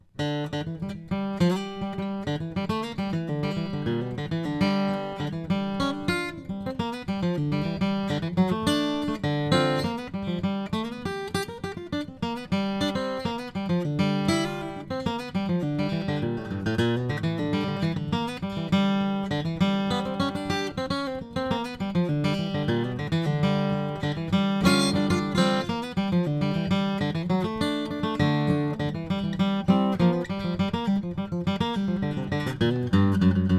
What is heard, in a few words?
Model J Mahogany, guitars; Chris Bozung